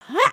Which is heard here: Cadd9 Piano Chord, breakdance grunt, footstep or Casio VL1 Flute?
breakdance grunt